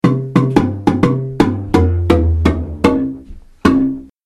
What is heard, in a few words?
bass walkdown, marimba